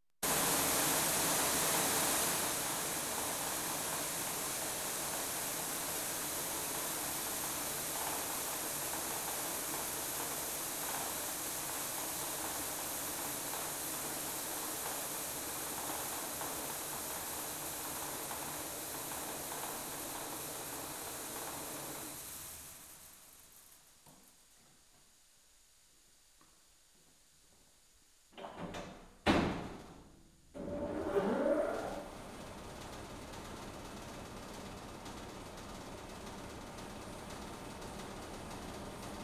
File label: Farm Machinery Animal Feed Mil; BBC Sound Effects Library